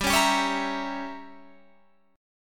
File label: G Diminished 7th, Guitar, Standard; strummed; JGuitar